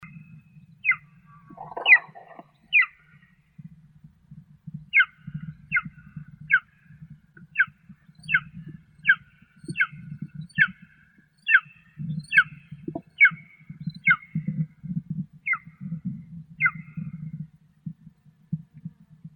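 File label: golden eagle, Aquila chrysaetos; berkut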